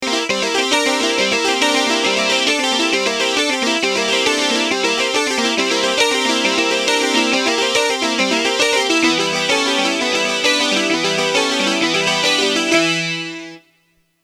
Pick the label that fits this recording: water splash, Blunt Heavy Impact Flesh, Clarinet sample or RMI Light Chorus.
RMI Light Chorus